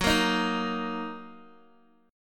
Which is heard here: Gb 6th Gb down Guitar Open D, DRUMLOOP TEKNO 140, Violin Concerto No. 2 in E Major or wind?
Gb 6th Gb down Guitar Open D